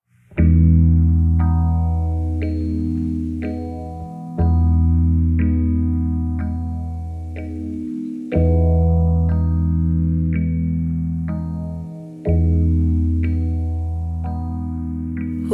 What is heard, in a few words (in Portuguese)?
acustico